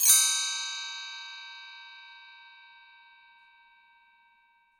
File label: Bell Tree; Sonic Foundry (Sony Creative Software); Joe Vitale Latin Percussion